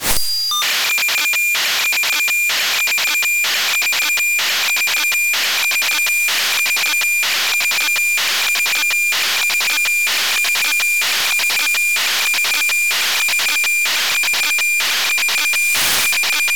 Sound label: Monitor 2TLM